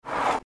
Object Slide Move Short; Slides; Foley

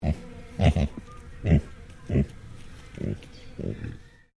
Animal Pig Tamworth Grunt Sequence; Hamish; Edgar's Mission Farm Victoria; Pigs; Animals

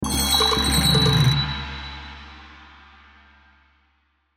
Percussion Jumble; Cartoon; Musical

